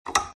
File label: open switch light; fox audio